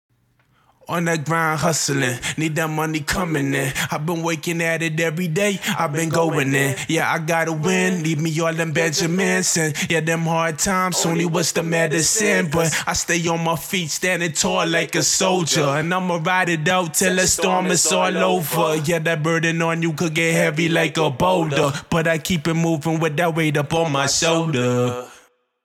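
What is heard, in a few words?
hip hop, 160, E major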